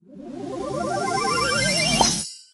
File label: char respawn